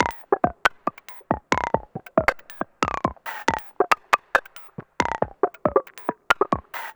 Electronic Percussion

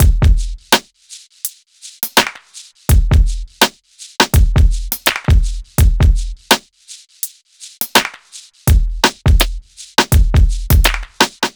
Urban Beat, Drums

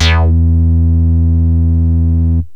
fm bass, yamaha rm1x synth

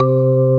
C3 PERC; roland1

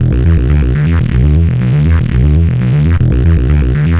Synth Bass; Basses

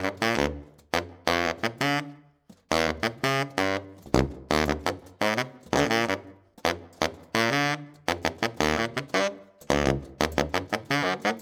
Sax

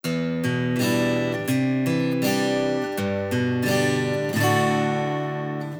Acoustic Guitar; Guitars

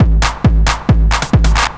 Dance Drumloop; Drums; Dance Beats

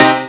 PIANO; SoundFactory

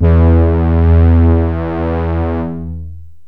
mg pedal, yamaha rm1x synth bass and lead

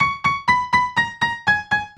Acoustic Piano

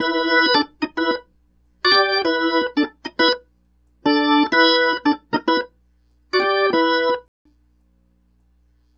Organ

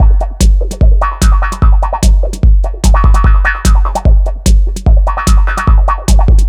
Dance Drumloop; Drums; Dance Beats

